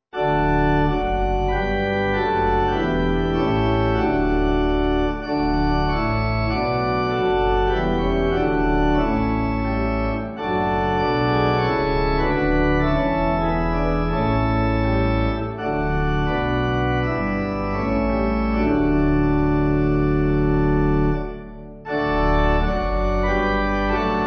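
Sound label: Pipe, Pipe Organ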